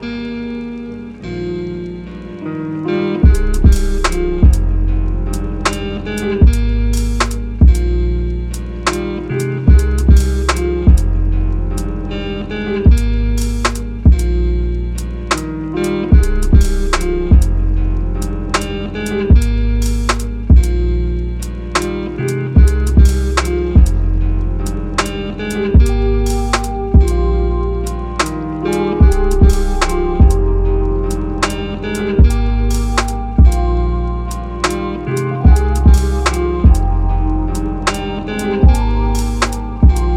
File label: Sad Lo-Fi